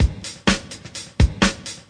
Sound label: 126 bpm, dropdead skipme, drum loops and breaks